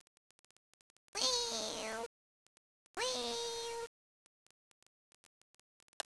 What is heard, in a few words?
tiger